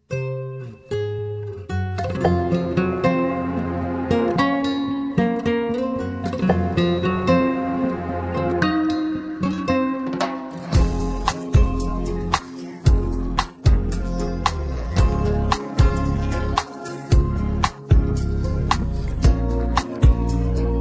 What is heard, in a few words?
D♭ major bpm 113